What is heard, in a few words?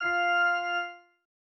organ